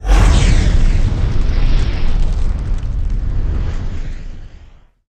Fire Orb; Yharon

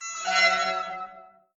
Scream

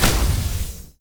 Hit; Wulfrum Prosthesis

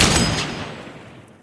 Gauss Weapon Fire

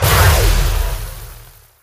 Charged Fire

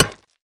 Voidstone Mine

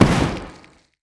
Plague Boom

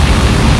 Welding Shoot

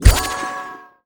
Art Attack Cast

